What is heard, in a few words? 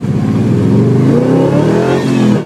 first, vehicles; chev suburban